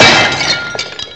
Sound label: SHATTER; M8; CMI Factory